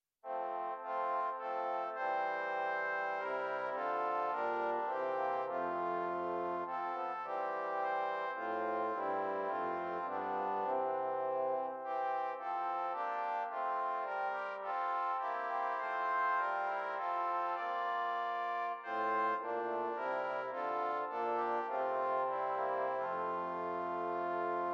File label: Strings; Richmond